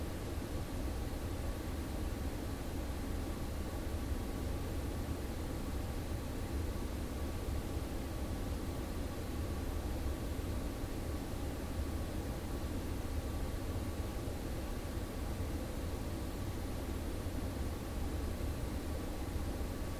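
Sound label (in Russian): специфический калибровочный шум по уровню и частоте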